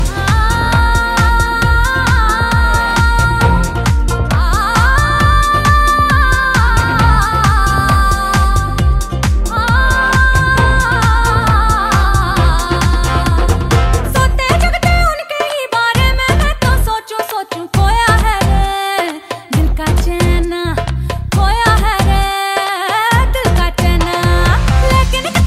Remix Bollywood Dance Ringtone

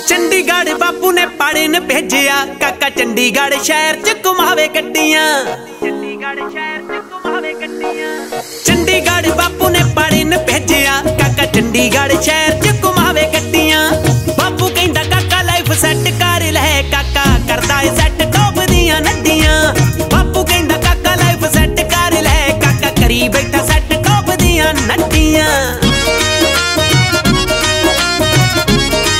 Punjabi Song

